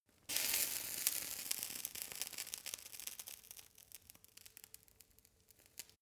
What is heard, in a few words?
fire torch sizzle phase